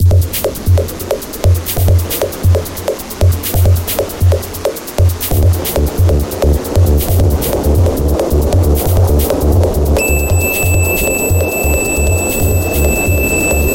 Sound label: Thunderstorm